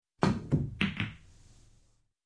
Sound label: Billiards Hall Off Bumper; Sound Ideas 2000 Series